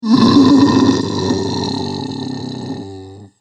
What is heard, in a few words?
Zombie Groaning, Zomby Sound Effects; horror